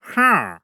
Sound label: wandering trader accept